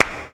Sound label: Claps